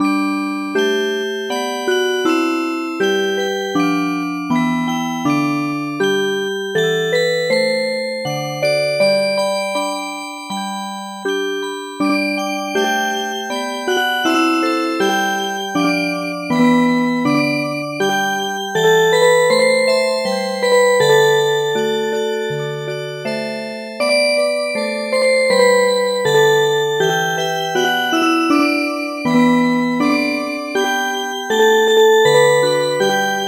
Music Box, midi; Aegukga

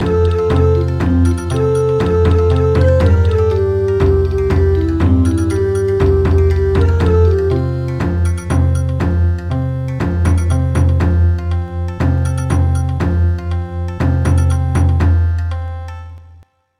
Bon Odori; traditional; Ring ring there is a bicycle

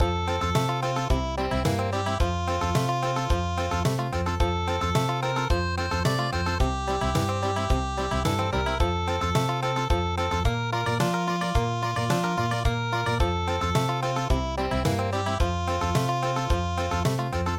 country

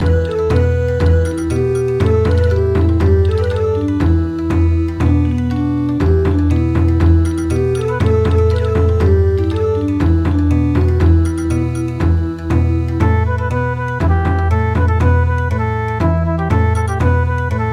Bon Odori

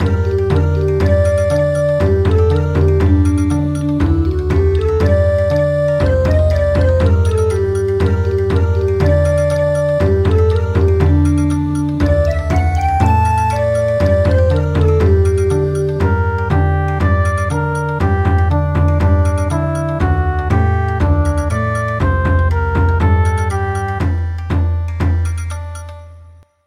Bon Odori; Canaries

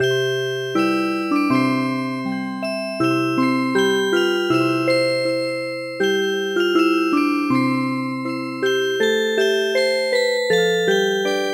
Music Box; traditional

